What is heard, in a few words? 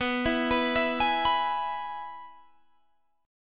E-Major B